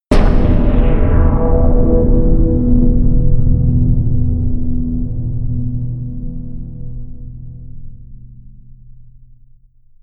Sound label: crush